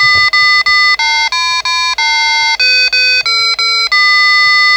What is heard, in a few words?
old mac donald; short; maximus